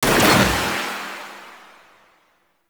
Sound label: Detron Mech, Weapons; Warframe; Void Trader